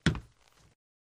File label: Metal Runners Right, footsteps metal